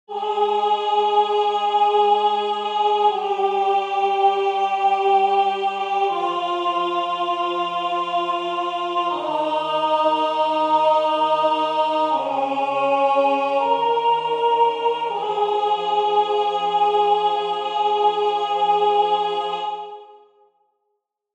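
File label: End Of The Day soprano; Waiheke Island Choral Society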